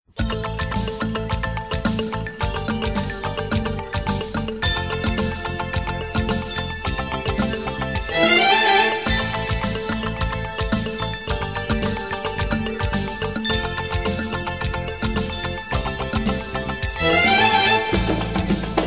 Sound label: Instrumental